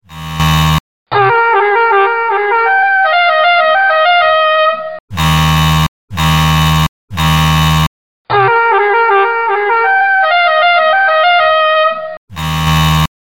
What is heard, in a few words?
Wake Up Clock Alarm